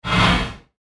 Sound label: Whoosh Fast Static Attack; Blastwave; Whoosh Sound Effects